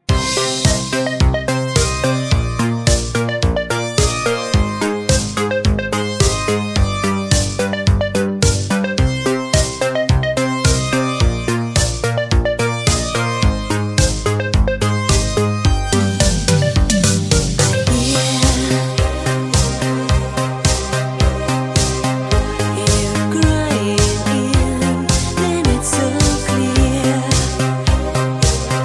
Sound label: Flute